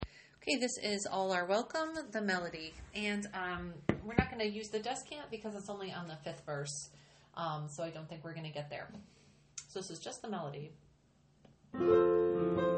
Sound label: all are welcome Melody